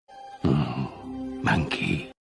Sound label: mmm monkey